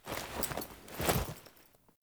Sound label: Foley Action Wolf Mount, Clothing; Characters; Player